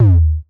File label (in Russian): zvuk napolnogo toma dram mashini